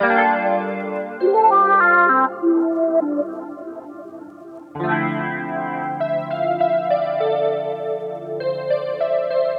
R&B Melody Loop 100 BPM D Min; Cymatics; Oracle Sample Pack

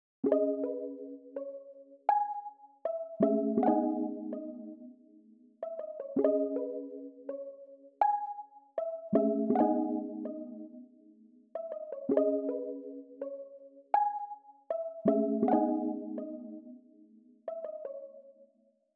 162 BPM C# Min Rhodes, Loop Stems; Melody; Melodies; Cymatics; 2022 Melody Mystery Expansion; Feelings

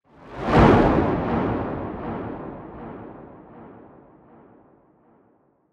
Epic

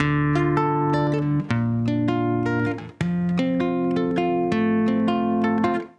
Guitar Loop 160 BPM D Min, Dry; Melodics; Instrument Loops; Guitars; Cymatics; Cobra Hip Hop Sample Pack

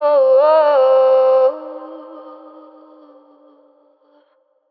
Ad Lib Wet C Min, Vocals; Cymatics - Eternity Sample Pack; Ad Libs

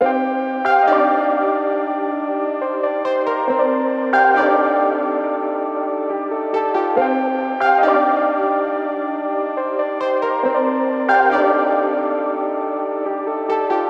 138 BPM C Min (ARP+MM+OBX+P5), Synth; Afterlife; Cymatics - Fantasy Synth Sample Pack

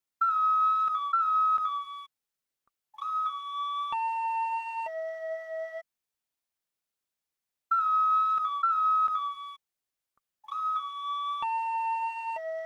128 BPM C# Min Ocarina; Melodies; Loop Stems; Cymatics; 2022 Melody Mystery Expansion; Chicago

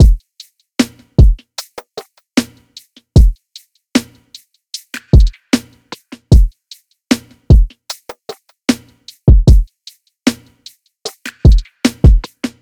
For You Drum Loop - 152 BPM; Cymatics; Vibes II Drum Loop Collection

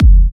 Kicks

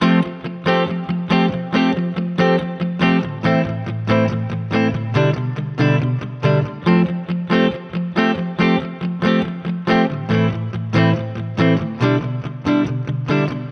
Electric Guitar Loop 140BPM Amin; Leads; Ghosthack

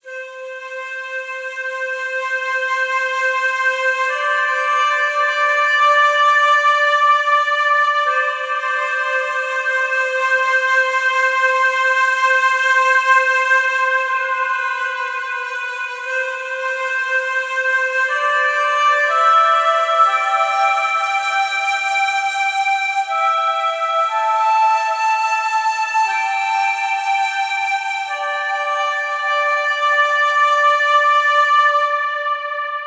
Flute - Am 60Bpm; Construction Kits; Ghosthack; SkyRealm; When The Bell Tolls

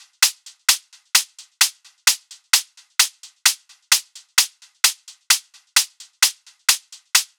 Hat Loop 130, Techno

